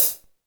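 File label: hi-hat, Real Mega Drums; Best Service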